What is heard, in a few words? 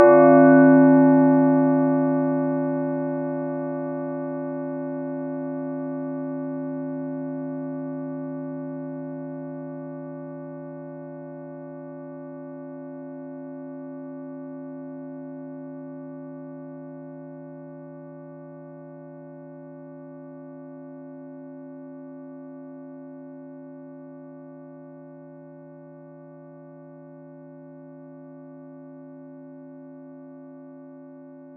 synth; sound effects and sound reinforcement